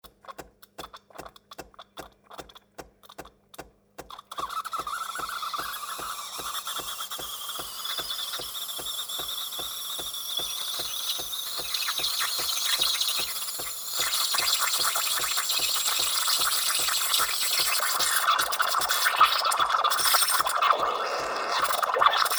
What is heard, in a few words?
cappuchino machine